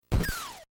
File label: gunshot; adventure; video game music